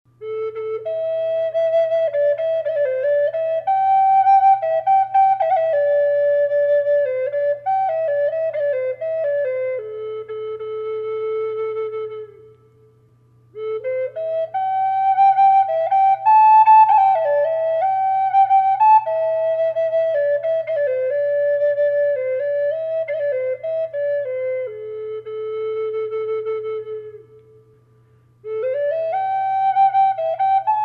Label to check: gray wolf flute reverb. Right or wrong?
Right